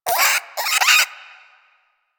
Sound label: Cryptilex Vocal Alerted; Warframe; Hunting Critters